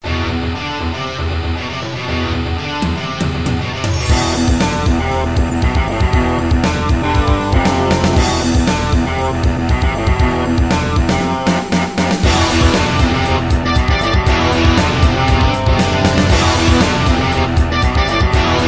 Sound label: BGM STAGE; Commando: Steel Disaster